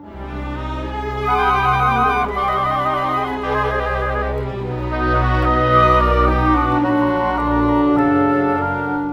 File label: Cinematic Orchestra